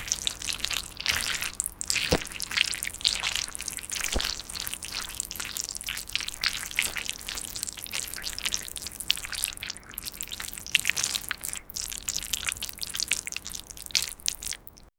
squishy mud sticky mud

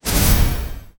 radiant burst of holy lig

